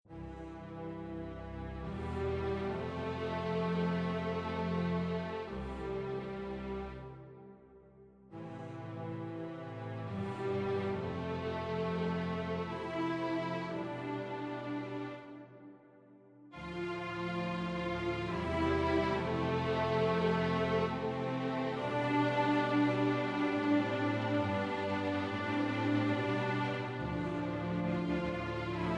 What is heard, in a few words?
Song of the Earth Soprano Up